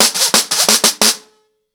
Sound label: FX 90 BPM, acoustic drums; The Roots Drumloops